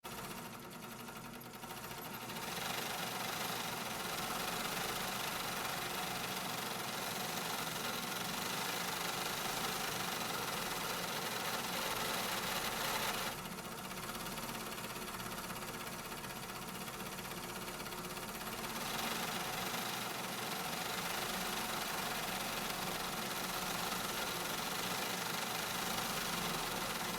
factory sounds

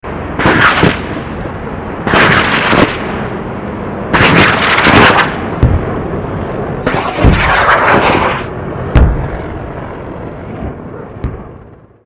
airplane rocket fire close

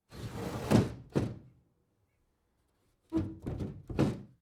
glosing old window

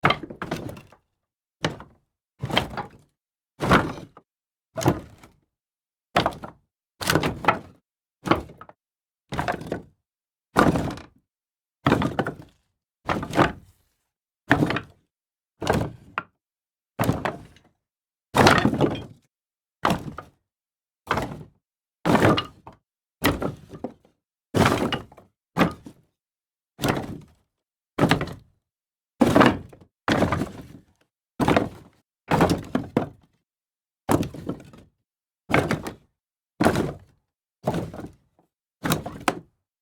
tossing wood series, foley

